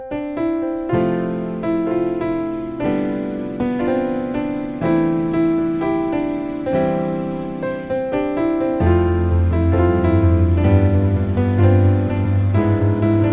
romance piano; classical

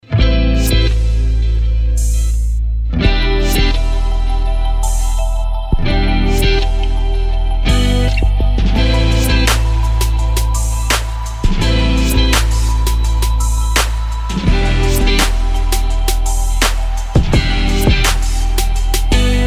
guitar magic; ringtones

